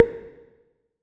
button, ChromeVox Earcons, Reverb

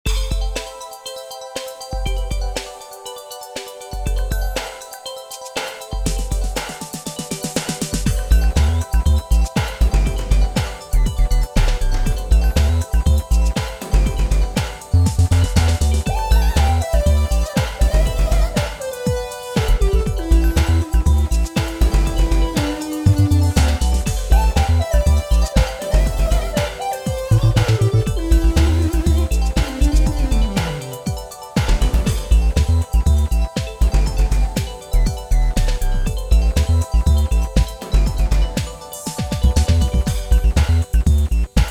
main music; RS Games Sounds; Cards Against Humanity

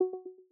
Liveness End; iOS 27

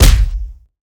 fists hit; Original TK Sounds

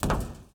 roof step; Golden Crayon Sounds

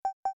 camera results ready; Business skieme Beta 1

